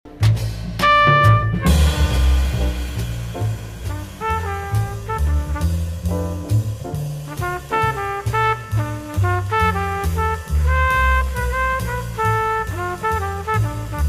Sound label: Solo